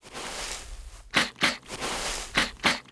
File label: Panda idle